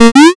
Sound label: picoyes